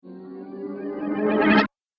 Fantasy Spell, magic sound effect; Role Playing Game; Andrew Fleming

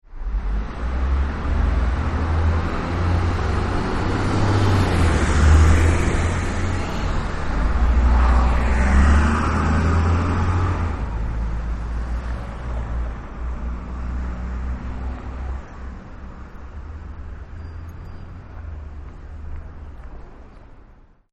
Trucks Depart; Vehicles

